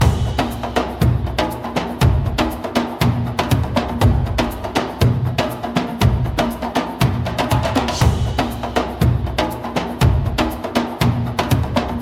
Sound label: exciting loop